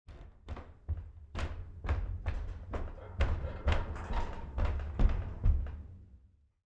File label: Footsteps Walk Wood Floor Perspective Basement Pipes Movement; Walk Below Wood Floor; Foley